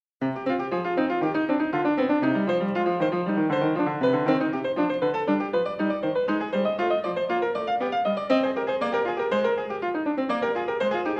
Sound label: Prelude No. 2 in C minor, Bach, Well-Tempered Clavier; BWV871